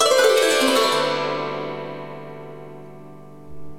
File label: CHINESE KOTO; OMI - Universe of Sounds